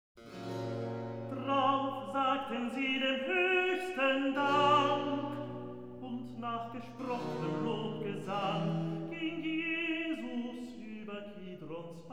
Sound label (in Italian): Recitativo, Accompagnato, Coro; Drauf sagten sie; Es is gewiß; Brockes Passion